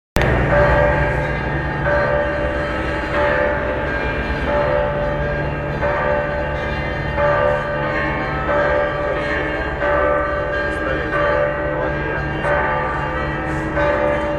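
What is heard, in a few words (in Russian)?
колокола церковный перезвон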